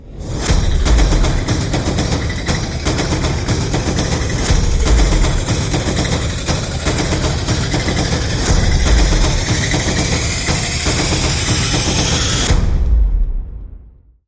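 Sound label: Rise Rhythmic Kinetic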